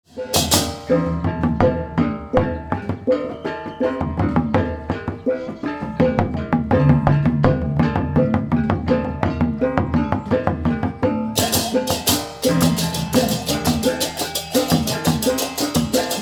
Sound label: Gamelan for funeral